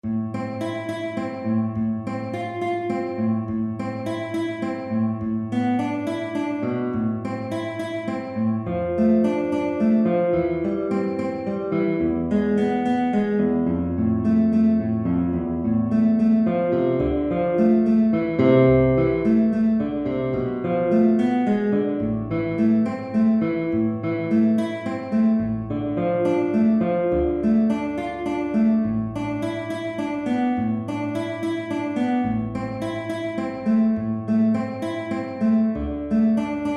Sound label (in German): gitarre